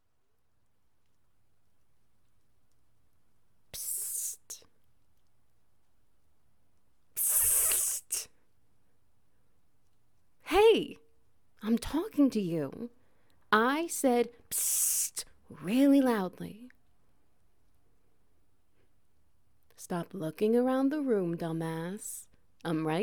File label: Ambience Wet Noises; A Cursed Sword